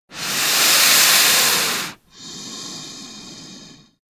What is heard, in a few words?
Snake, Bull Inhale Then Exhale. Close Perspective.